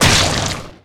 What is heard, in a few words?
Blood Splat Gib; SFX Kit; Foley - Misc